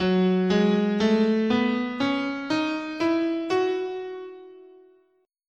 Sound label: F# Dorian, scale